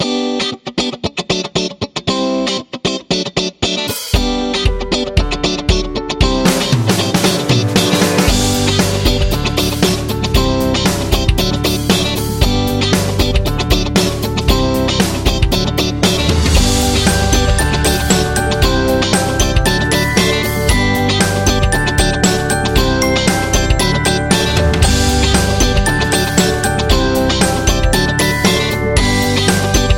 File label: Happy Rock